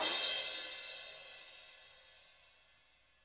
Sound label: crash, Drum Kits; Sonic Reality; Interactive Drum Kits; 90s sample cds